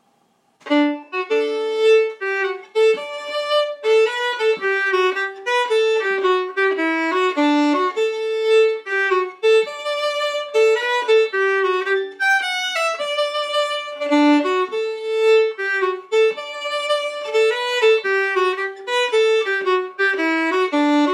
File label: Mazurka